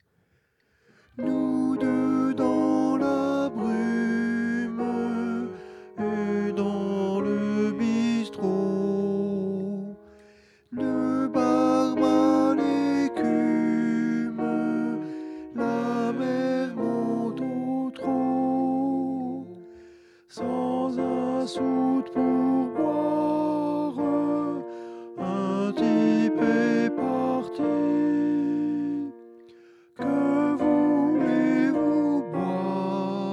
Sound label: smog soprano